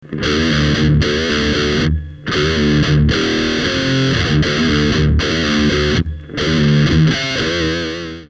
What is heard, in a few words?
metal